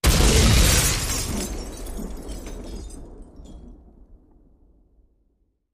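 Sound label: Explosion Glass Debris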